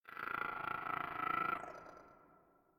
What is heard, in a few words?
Creature; we are the dwarves gamerip 2016